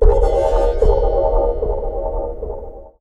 Moog LPF & Delay, contact mic, Wire & Tin; musicradar; contact mic madness

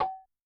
Factory, Yamaha DTXpress-II snare

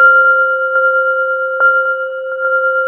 belling C3

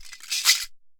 MARAKA SHAKE; Best Service; World Colours

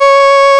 REED C#; Big Fish Audio; Synclav FM

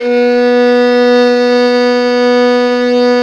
violin B1, Balkanish Traditions

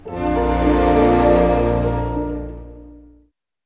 ORC DOOM; East West; Scoring Tools; Doom's Day

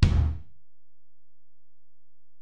Ac BD, Alesis DM-PRO; 300 Drum Machines

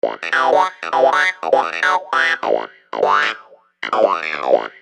Phunky Wah Clav; Construction Kit; HIP-HOP; Sonic Foundry (Sony Creative Software)

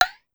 Conga Slap Up, Pitch Env; Clavia DDrum-AT; Pitch Env Various

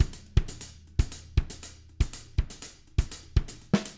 120, Living Drums, CARIBE; Sampleheads; Peter Erskine Living Drums

